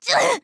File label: Grunt Hit; Skye